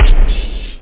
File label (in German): bumm; szenarion